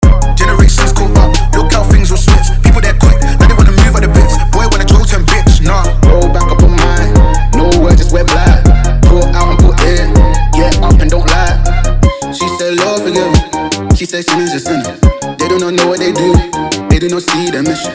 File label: Cloudy with vocals